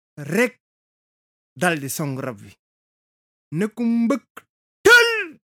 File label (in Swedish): bip